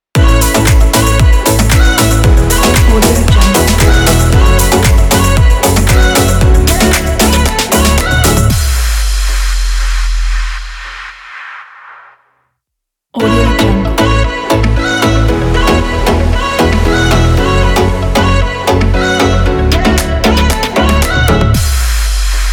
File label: summer future pop logo